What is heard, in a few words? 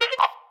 Chat Notification